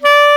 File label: clarinet D4; InVision Interactive; Lightware VOL-1; Instruments & Percussions